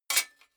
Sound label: sword sabre metal block